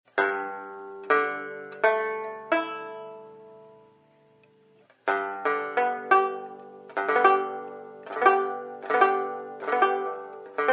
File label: chord